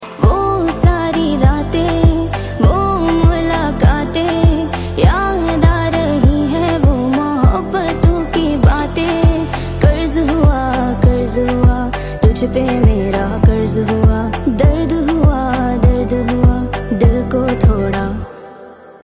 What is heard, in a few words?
Ringtone Female Version